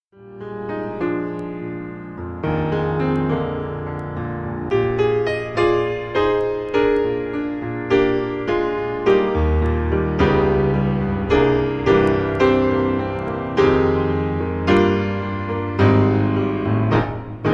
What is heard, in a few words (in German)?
VaterUnser Instrumental